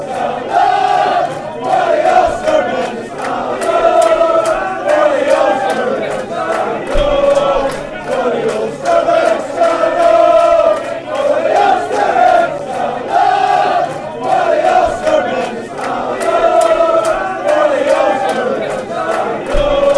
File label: chants; stand ulster men